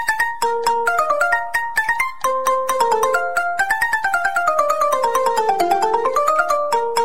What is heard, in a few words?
notification ringtone; popeye